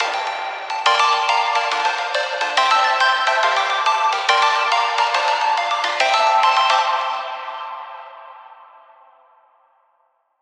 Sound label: Dubstep